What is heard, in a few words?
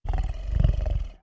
CREATURE GROWL